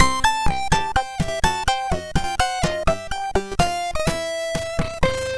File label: sid, MOS6581R2; Total Refill